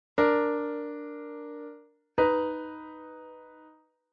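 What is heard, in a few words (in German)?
Intervalle kleine Sexten; Unreine Verminderte Uebermaessige Intervalle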